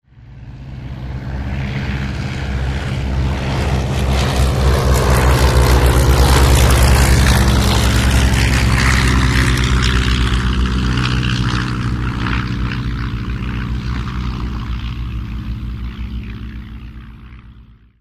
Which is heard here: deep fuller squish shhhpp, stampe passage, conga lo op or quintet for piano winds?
stampe passage